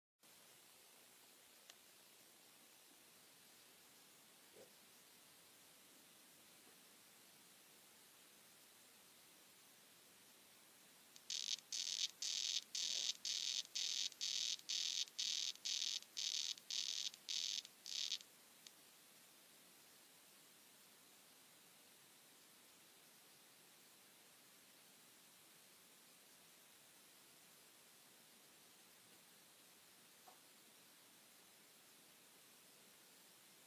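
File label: Ranitomeya reticulata calling, frog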